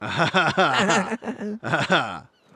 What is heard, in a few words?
stoned laugh